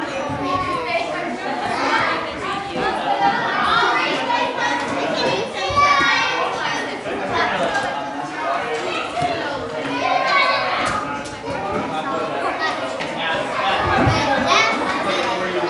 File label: Tons Of Kids Play